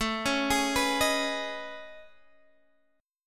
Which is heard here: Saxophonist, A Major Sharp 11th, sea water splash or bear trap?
A Major Sharp 11th